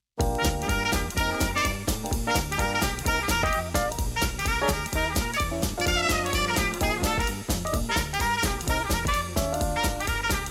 Stimmungen Swing

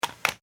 home akkuschrauber einstell

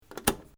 kueche verschluss

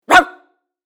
Mensch bellen

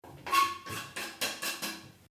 Vom Brettchen kratzen

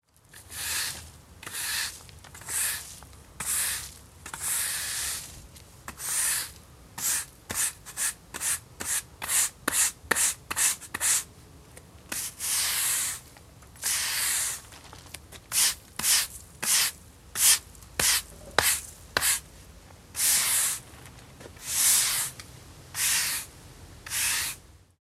Fegen

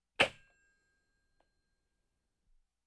Im Buero Telefonhoerer auf Gabel knallen

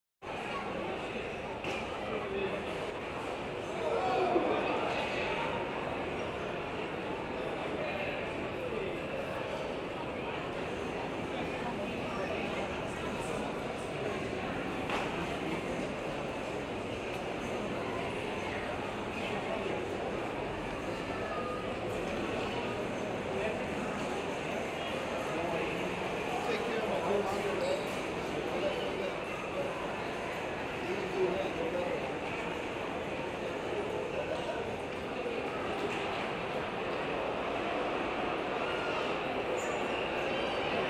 Sport Baseball Mannschaftsraeume

